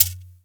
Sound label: CHEKERE; Big Fish Audio; Synth City